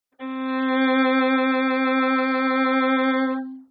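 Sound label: vln range Low, violin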